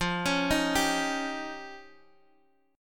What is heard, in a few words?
F 7th Suspended 2nd Sharp 5th, Guitar Open D; x,8,11,9,10,x